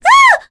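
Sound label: Vox Down, korean; voices; heroes; Requina; kingsraid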